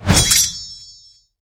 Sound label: bladesinger blade dance